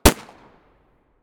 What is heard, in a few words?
glock17 muzzle mono far